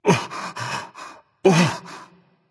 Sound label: fear berserker; cuchulainn